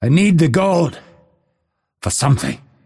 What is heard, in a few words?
lasthit pain; dota; phantom assassin